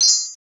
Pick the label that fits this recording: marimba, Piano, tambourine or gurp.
tambourine